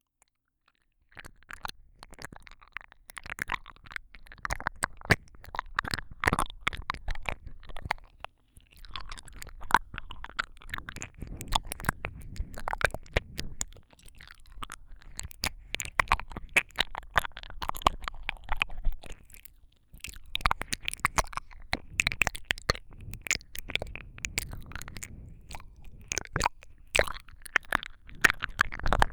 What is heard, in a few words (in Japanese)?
唾液多めで水っぽい耳舐め, ASMR